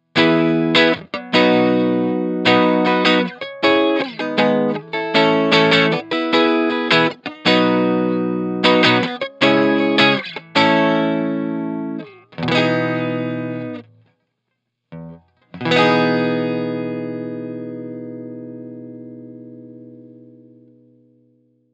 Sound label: 18W CLEAN; Fender Oxford